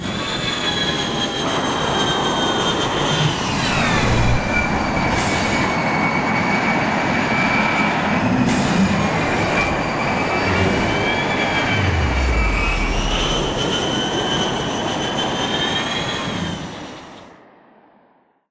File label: dropship flyer attack vertical succesful; crow; Titanfall 2